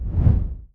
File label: gui close; minecraft